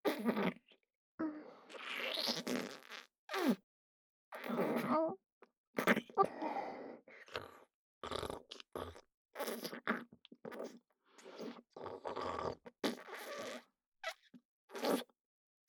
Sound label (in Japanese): ツンデレ ねっとり口フェラ; フェラ素材